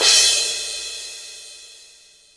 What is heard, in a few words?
long room reverb crash single hit g key